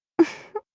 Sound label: giggle